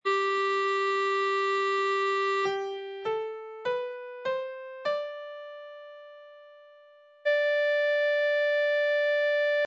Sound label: g major d